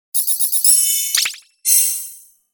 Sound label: Logo Squeaky Shimmer Shine; Sonic Logo; Blastwave